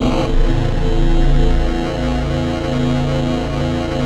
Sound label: synth clav; Best Service ProSamples vol.55 - Retro Sampler; AKAI